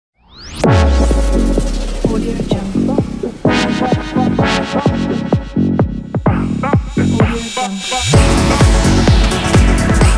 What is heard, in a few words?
modern house intro logo